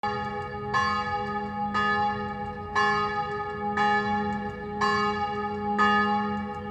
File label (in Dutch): kerkklok; erpfendorf